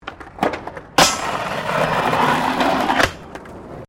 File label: Skateboard Grind; Skateboard sounds; Sports; Skateboarding